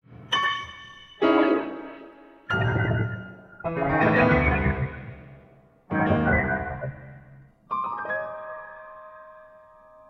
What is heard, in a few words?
piano computer